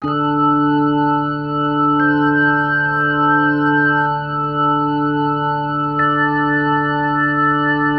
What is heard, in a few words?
Bass, Promotional Loops; ESSENTIAL SOUNDS III; Sonic Foundry (Sony Creative Software); Not Just Another Pretty Bass